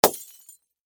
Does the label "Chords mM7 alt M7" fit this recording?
No